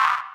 ui decline; ScrapFrontier

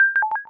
door unlocking; sfx; CultManor

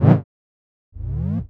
minelayer shot; sfx; weapon; ScrapFrontier

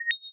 key picked up; sfx; CultManor

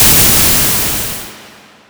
tesla shot; sfx; weapon; ScrapFrontier

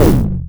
cannon shot; sfx; weapon; ScrapFrontier

